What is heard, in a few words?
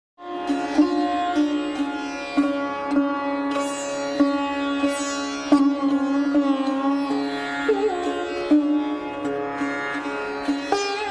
music